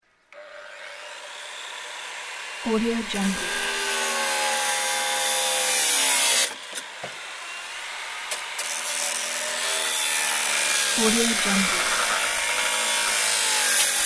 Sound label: angle grinder cutting metal